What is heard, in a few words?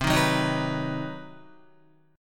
C Minor Major 7th Flat 5th chord, Guitar; strummed; JGuitar